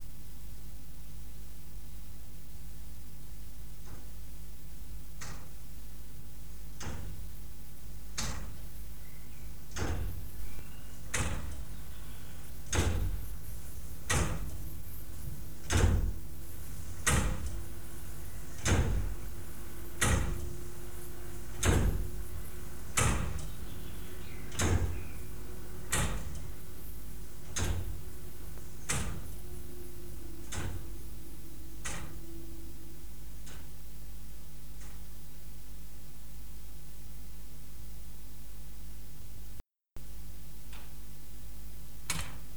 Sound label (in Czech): hodiny mix; hermit i audiokazeta 1992